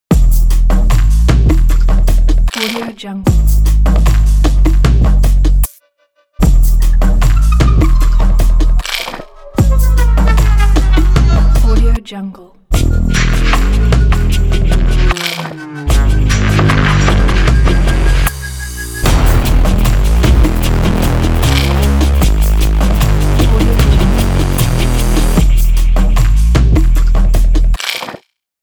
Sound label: beat glitch logo